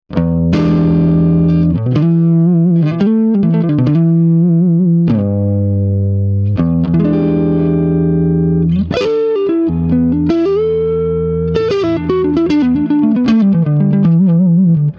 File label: Swart Amp